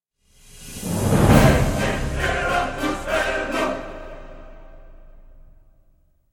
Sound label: Scan Complete; Auc-Util-ScanFinish